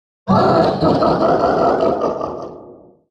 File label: laught; bowser